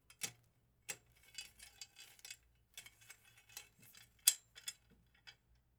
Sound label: Wires Moving On Metal; Fairlight Sound Lib